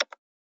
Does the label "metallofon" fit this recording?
No